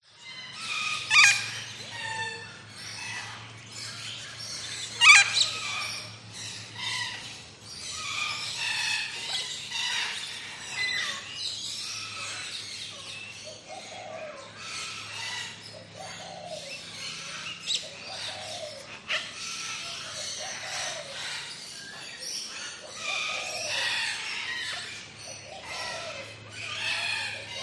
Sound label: animals birds tropical large avery amb parrots parakeets doves; zapsplat